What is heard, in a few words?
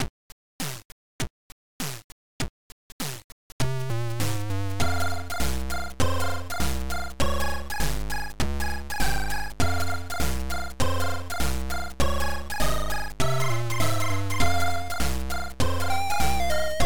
game music; Wubtris